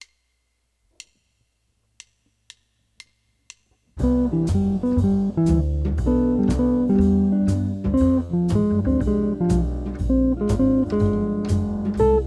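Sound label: Cmaj7 Fm6 backing track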